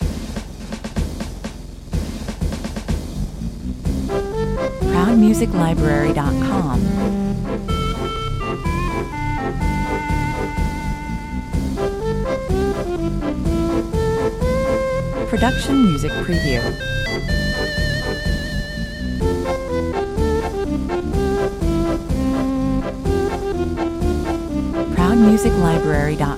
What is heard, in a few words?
bavarian beer 117 bpm